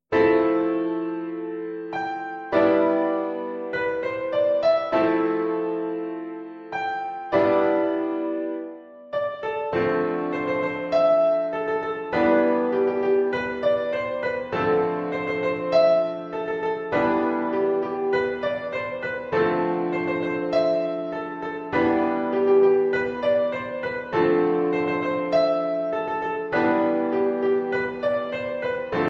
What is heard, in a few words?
EASY PIANO